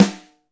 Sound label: FAT PICC, SNARES; Back In Time Records; Big Bang